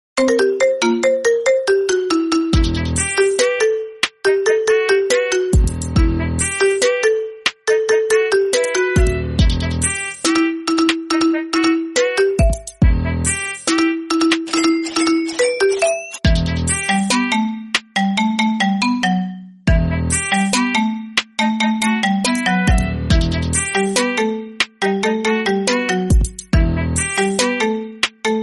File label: iphone x marimba instrumental tone